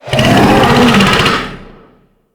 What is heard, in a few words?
Infested Bone Blade Vocal Soft; Enemies